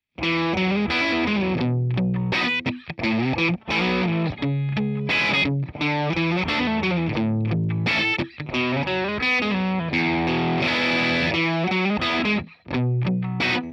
crunch phone

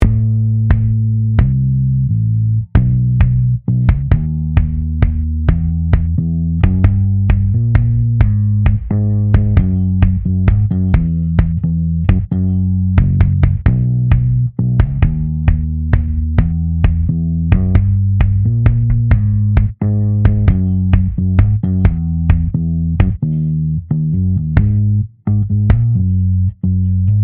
non sidechain bd bass

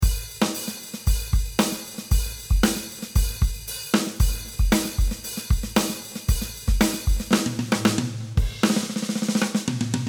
drum mix rev delay

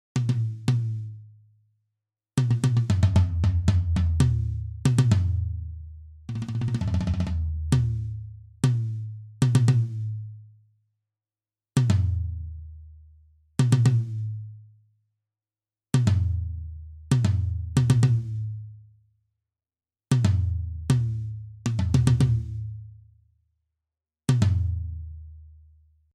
tom dry

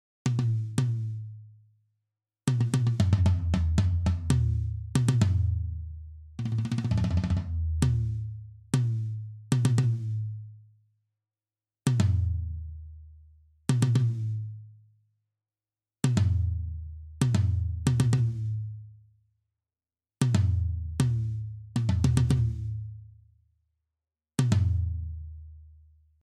gate off

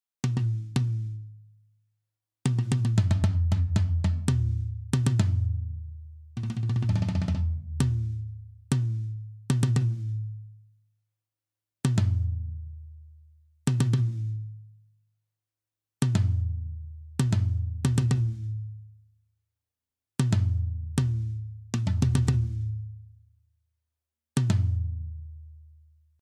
tom wet

